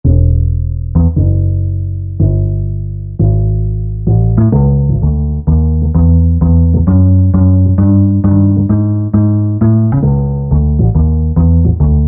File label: Sof Str Bass, Korg MSC-11S Brass